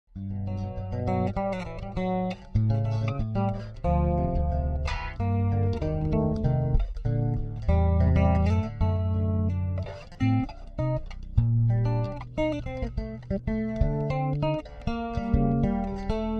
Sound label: Ballad